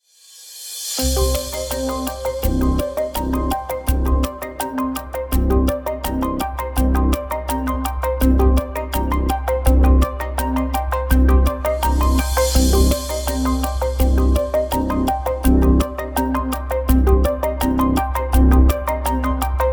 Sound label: thinking time